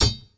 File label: block